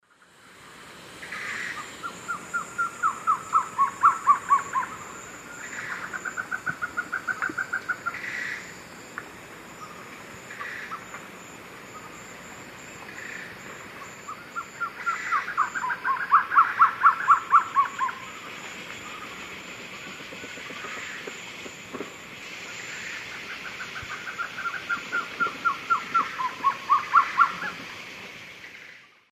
Trogon curucui, duet; S. America; Eastern Lowlands

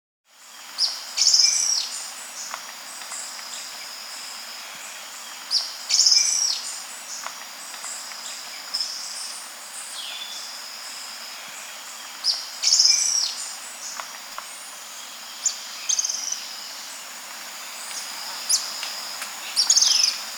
Cinnamon-Faced Tyrannulet; S. America

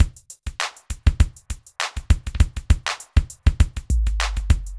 r and b

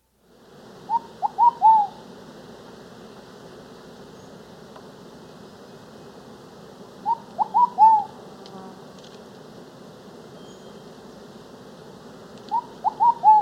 Ruddy Pigeon; S. America

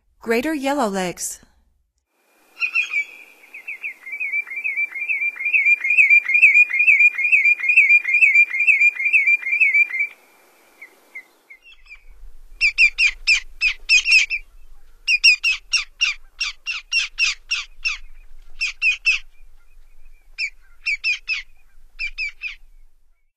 Greater Yellowlegs; North America; Stokes

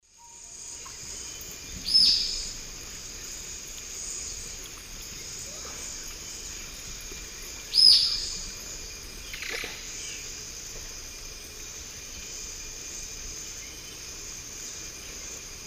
Tyrannopsis sulphurea, call; S. America; Eastern Lowlands

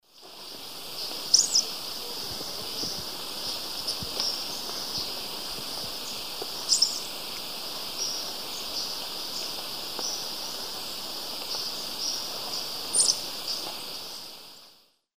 Dacnis flaviventer, calls male; S. America; Eastern Lowlands

